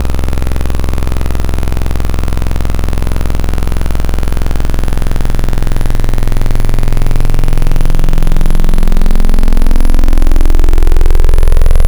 Digital Sawless; Synthetic's Serum Collection; Echo Sound Works Core Tables

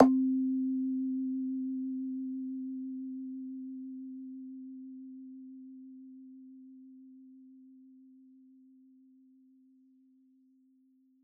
Rimba Smack, noises; HYPERBITS

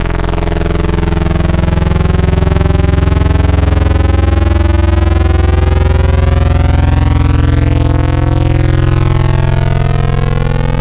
Saw Fifth; Synthetic's Serum Collection; ESW Core Wavetables; Echo Sound Works Core Tables

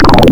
Sin, Serum Wavetables; Synthetic's Serum Collection; Cymatics - Nuclear for Xfer Serum Wavetables

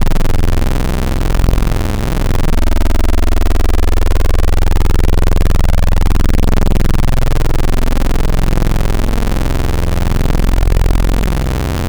90's Super Saw; Synthetic's Serum Collection; Echo Sound Works - Modular

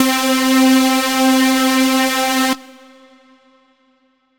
Saw C4; Synthetic's Serum Collection; Aubit; ChainPop Vol. 1

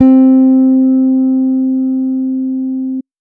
Guitar C3; Skimp; Evolution Of Sound; Tropical Revolution

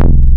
Subby Saw; Synthetic's Serum Collection

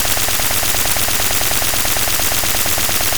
creature birds; lolicore

